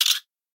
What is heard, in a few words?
camera click; Kyocera; DuraForce